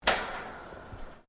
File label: METAL IMPACT CLANK; Metal Sound Effects; Metal (Sound Effects:Materials)